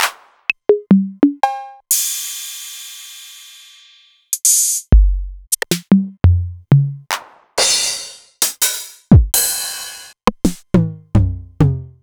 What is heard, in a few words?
Novation Drumstation; DrumstationV1